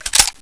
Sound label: default deploy